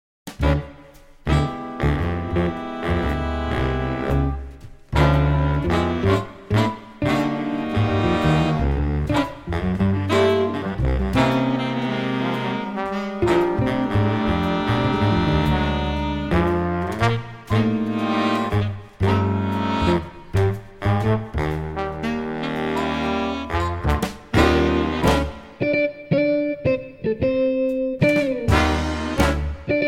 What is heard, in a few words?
Shuffle